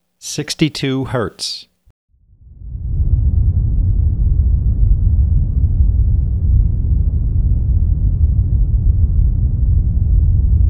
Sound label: pink noise 62hz